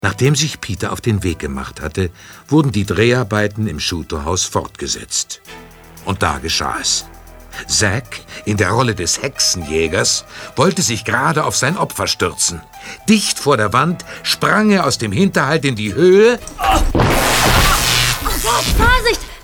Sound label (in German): Stromschlag; Die drei frazegeichen